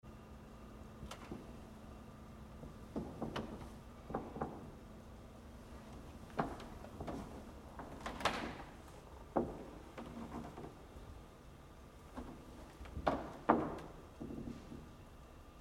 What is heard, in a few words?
Creaks Wood Church Pews Very Subtle; Ambient; Creaking; Objects; BigRoomSound